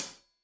Hat Tight, Hi-Hat; Deeply Sampled Drums

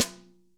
SNARES DRY; Real Mega Drums

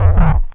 BLURP LOW, Hard & Loud Techno; USB Soundscan vol.01; AKAI

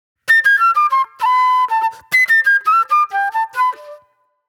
Flute

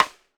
CLICK; Sampleheads - New York City Drumworks VOL-1

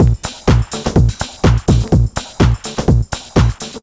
latent disco, Deep House 125bpm

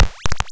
Silver BBD Pulse, drum machine; 300 Drum Machines; DevSnd

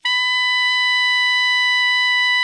ALTO MF B 4, saxophones; Greytsounds - Philip Wolfe David Crozier's Saxophones; ALTO SWITCH

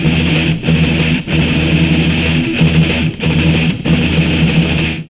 GTR; Roland - LA Composer vol. 2

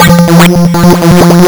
lsdj waves; wavetables

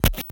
drums raw; houstontracker2

